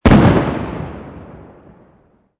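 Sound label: explosion medium; amb elements; modern warfare 2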